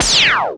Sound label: Blaster